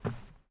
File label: step wood